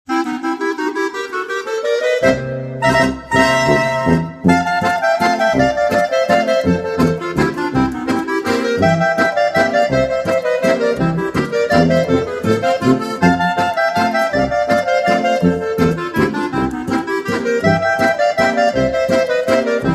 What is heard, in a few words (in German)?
klarinettl